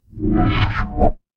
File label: magic scifi teleport